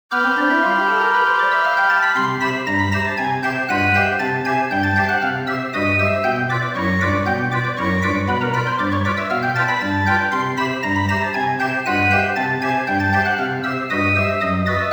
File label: spin